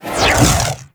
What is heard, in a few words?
Glaive Charge; Warframe; Teshin; Weapons